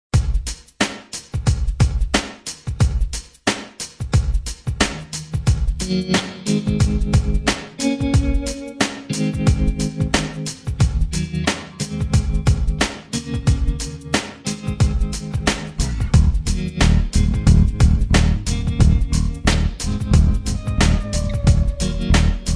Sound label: Funk Tale; Suite Collection Vol.4